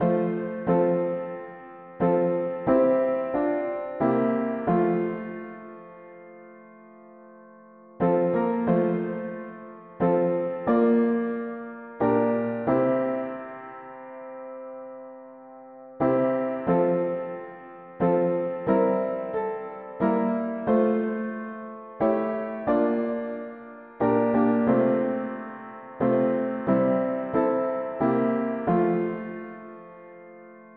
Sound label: mono piano, hymns; O Lord a few of Thine